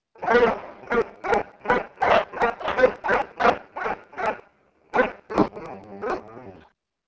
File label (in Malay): anjing menyalak